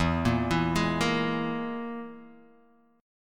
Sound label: E Major Flat 5th, Guitar Half Step Down